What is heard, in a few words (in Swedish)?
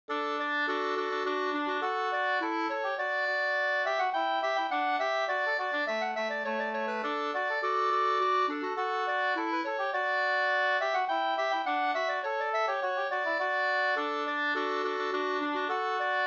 slangpolska